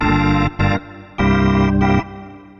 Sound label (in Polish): organy